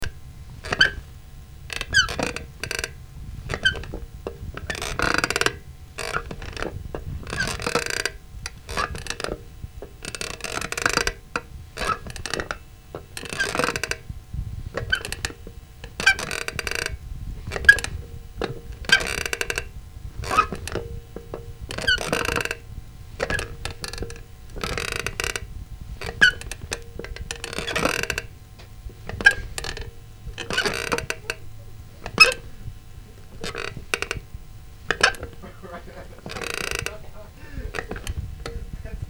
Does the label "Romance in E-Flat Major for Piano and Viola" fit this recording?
No